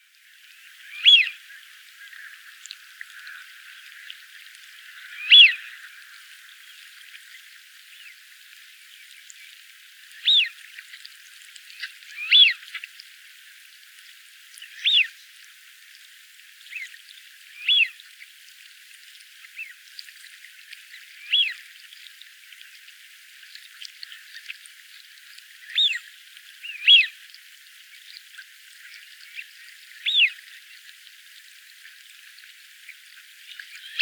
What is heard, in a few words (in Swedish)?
Bläsand